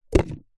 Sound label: food drink coffee cup takeaway single empty set down upside down